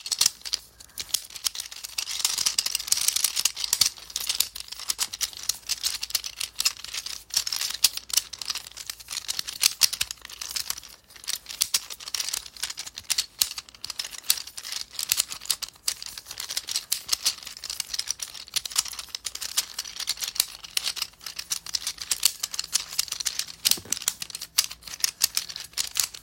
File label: fidget infinity cube; Golden Crayon Sounds